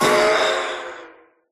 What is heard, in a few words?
death, phantom; mob; Minecraft